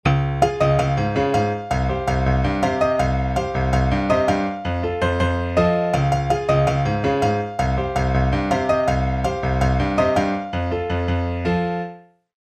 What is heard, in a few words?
happy song